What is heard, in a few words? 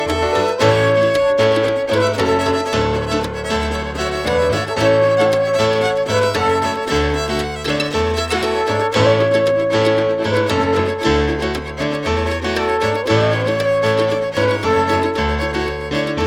trombone higher